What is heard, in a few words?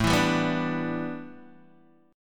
A 7th Suspended 2nd Suspended 4th A Guitar DADGAD, chord